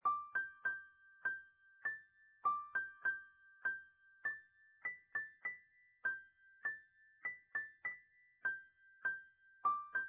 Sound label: Kurdish